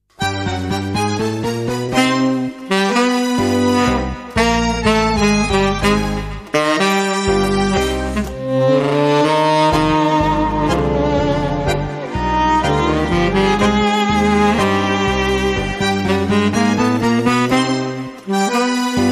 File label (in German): Tango